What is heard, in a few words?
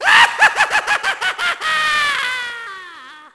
Tiki Chant; Finding Nemo; XBOX